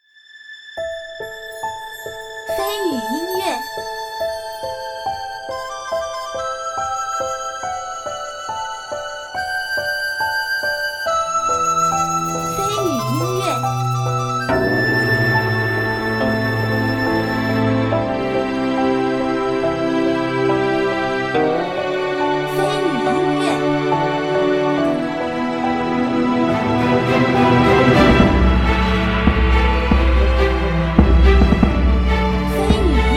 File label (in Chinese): 梦幻 大气 忧伤